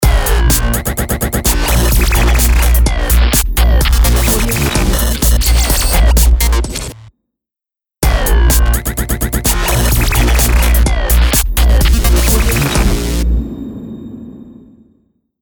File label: dubstep glitch logo